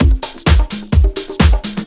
Latin Loop 128 BPM

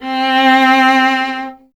STR VLA, Solo Instruments

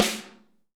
SN AMBIENT, Real Mega Drums

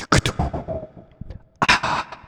Korg, FX

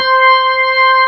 B3 C 5, organ; AKAI S-Series CD-ROM Sound Library VOL-1

